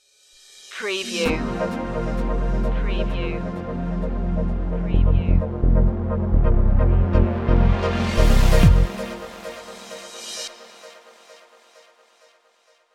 future run stinger

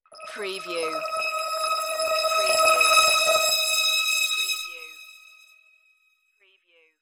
crystal interface beam

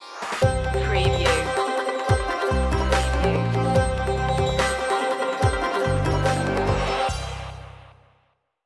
indian chase stinger

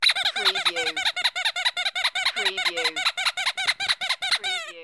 Funny Rubber Toy

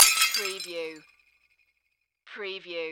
Crash Dish Break

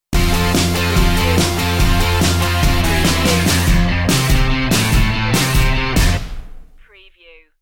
teen kicks stinger

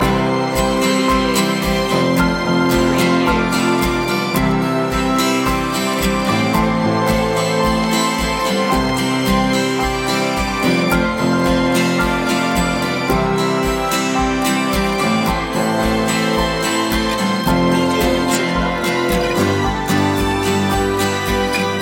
over an open field loop

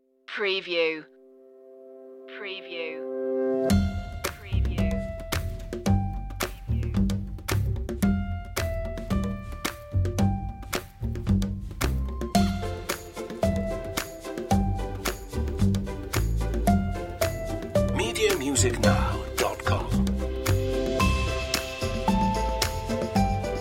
laugh and play less lead

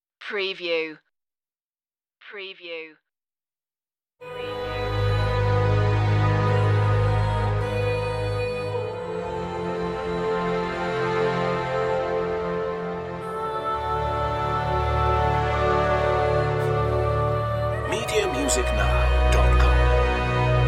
no perc

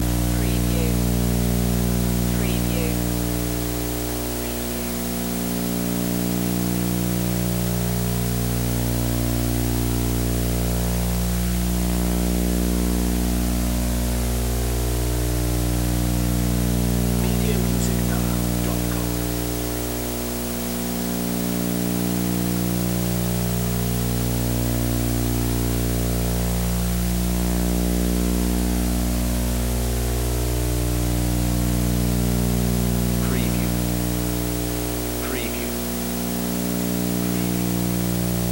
Sony Tube TV Digital Static Hum Snow

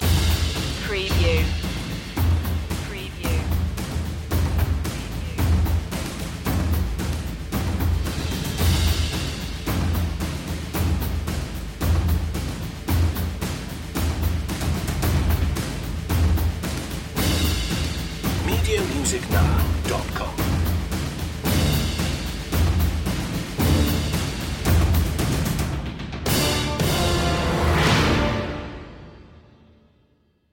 perc mix

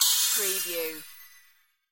Magical Burst Shimmer Bright

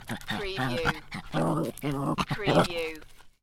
Animal Small Dog Growling

